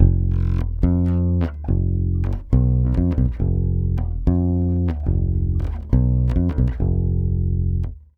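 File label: 140 BASS F DIM, Brazil Bossa; USB Soundscan; AKAI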